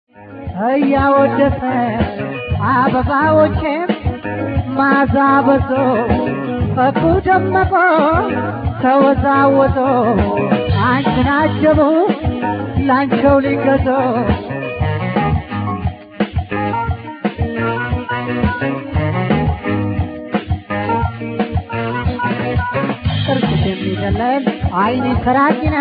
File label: tango